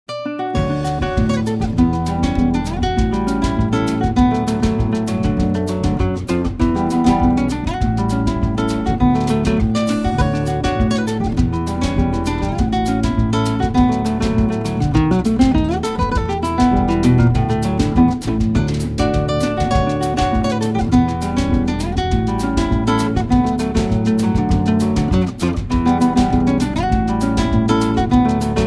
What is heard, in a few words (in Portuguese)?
Sons de Carrilhoes; Tuning to mellow tone